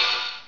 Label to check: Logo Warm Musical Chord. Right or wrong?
Wrong